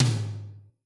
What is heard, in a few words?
Toms, Yamaha RY20